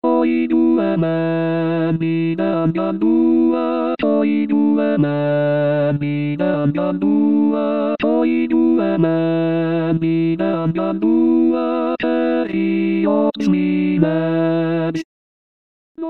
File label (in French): tenor